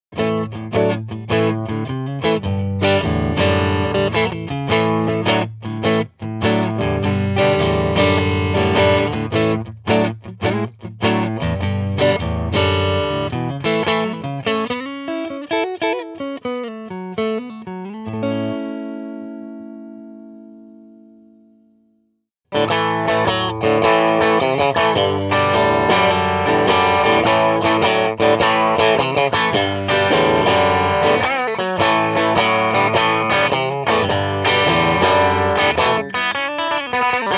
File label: Samples Strat Clean Crunch Lead; Sir Charles